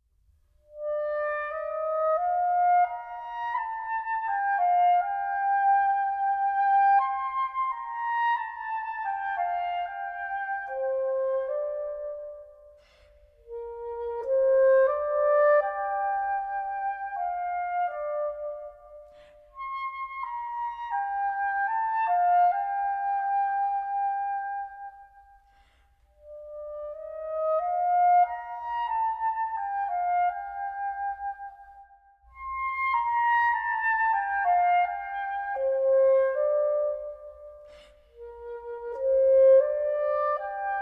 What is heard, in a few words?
Early Morning Melody (For Soprano Saxophone)